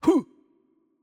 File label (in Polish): Attack Light; pl PL; bloom minigame